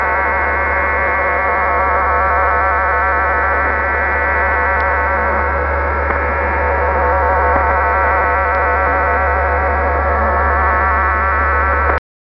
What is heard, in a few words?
Voice Frequency Telegraphy RTTY (USAF)